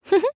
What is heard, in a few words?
giggle; celestia